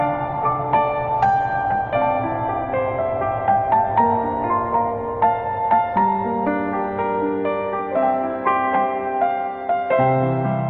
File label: piano ringtone; krishna